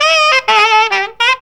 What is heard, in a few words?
FUNKY SAX; Zero-G; Funky Elements